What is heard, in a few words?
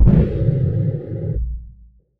Percussive Hit, contact mic; musicradar; contact mic madness